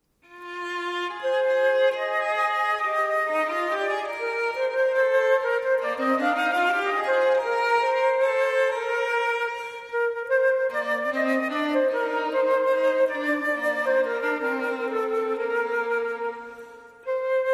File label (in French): Duo instrumental Flûte traversière et violon